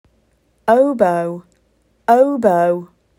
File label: Oboe